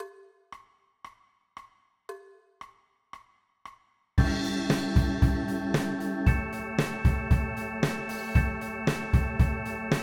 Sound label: blues rock backing track